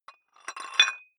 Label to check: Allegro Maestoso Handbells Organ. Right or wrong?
Wrong